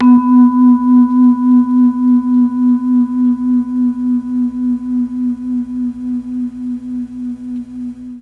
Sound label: B1 vibes, MKII mellotron